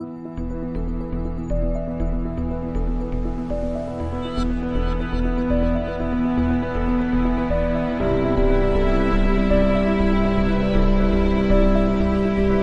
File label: Ambient